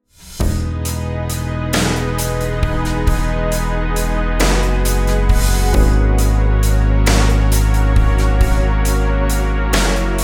rhythms compound 8s w16s

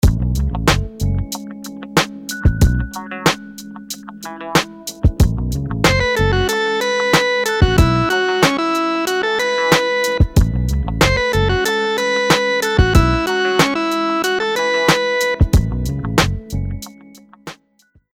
rhythms dotted 8s

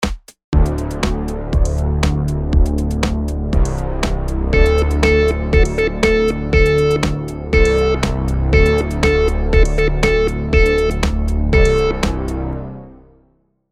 rhythm simple, monotone

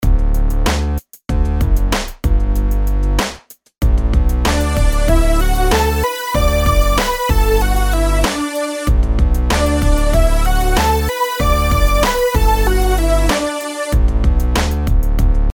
rhythms simple 8s major

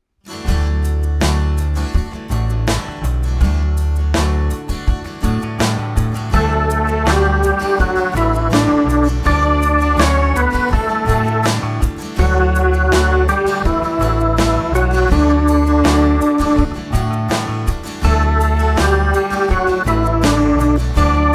melodic dictation

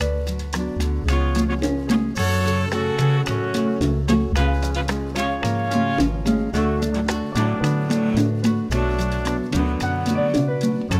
minor key chord triples, F sharp; harmony

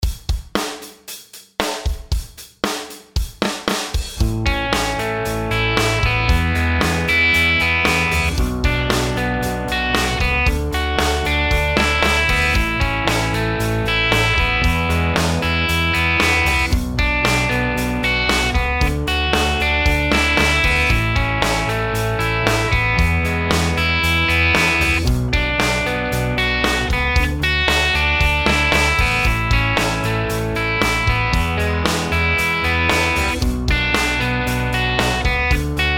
chord progs maj root; harmony

